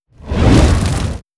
Warrior Furious; Battle

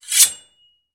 Drawing of Sword

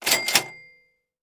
Store Item Purchase; UI

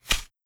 Scatter Shot Arrow; Battle; Ranger

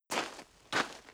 Footsteps Dirt

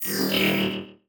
Item enchanted; UI

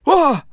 Male Scared; Emotes

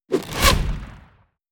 Piercing Arrow; Battle; Ranger

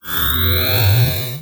Item enchanted; UI

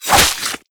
Whirlwind Strike; Battle; Warrior

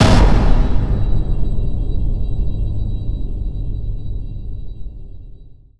Level Up; UI

